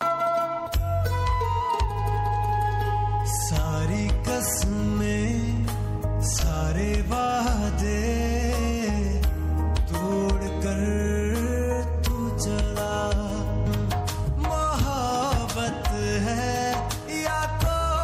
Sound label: pakistani ringtone